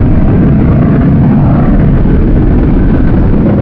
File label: air suck, incinerator; hl2; citadel